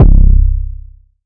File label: phonk 808 C; horse; phonk toolkit; rocket powered sound